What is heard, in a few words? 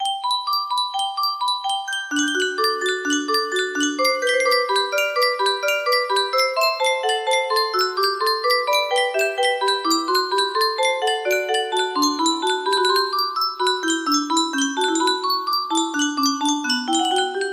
in G Major, music box melody; bach invention no 10; bwv 781